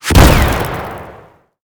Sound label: dash